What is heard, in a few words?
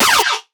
synth hit noise blast; Virtual Riot